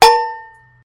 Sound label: Can Ding